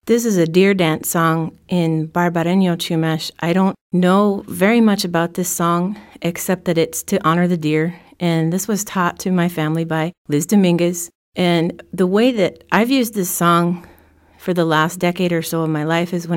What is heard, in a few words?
deer dance song story